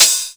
HH OLD O, Korg EMX-1, drum machines

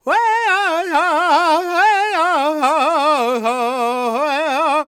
G# MIN, World Voices, LAKOTA

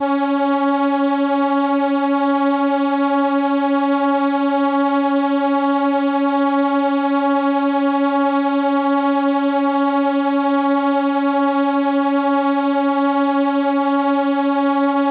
Trumpet C#5, ARP Solina

